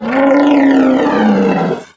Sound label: sight, doom3 pinky